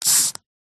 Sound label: foam spray bottle